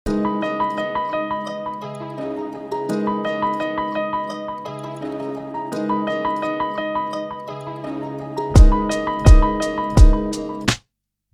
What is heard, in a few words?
Classical Hip Hop, Instrumentals